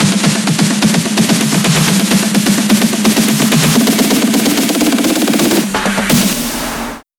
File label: Fills 128BPM, Clubsounds